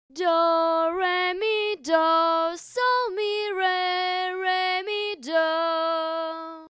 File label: solfa melody